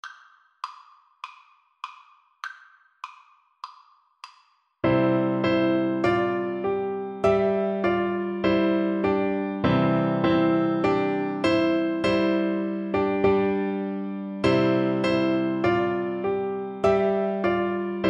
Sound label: ode to joy, Trombone